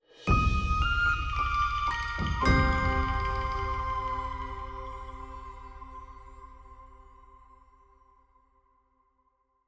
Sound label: complete Quest Stinger